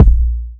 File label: small reverb kick drum sound a sharp key; Duration 0:01